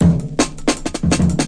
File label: 165 bpm tom bass, drum loop